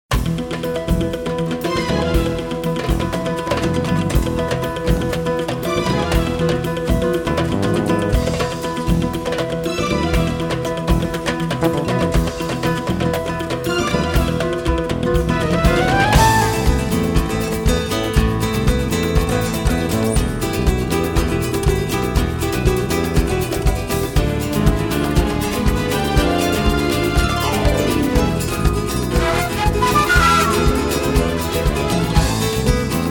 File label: classical guitar; On the Waves